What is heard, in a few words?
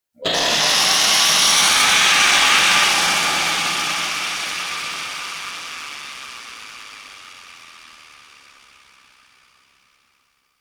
Shrill Screams; Ambience; Man In The Wall